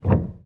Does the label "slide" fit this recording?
No